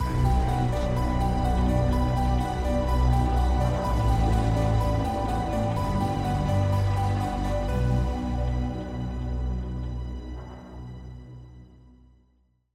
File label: Mystery Theme; soundscrate